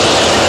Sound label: Sfx Winter games